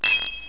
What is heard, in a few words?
warn; JokesMaster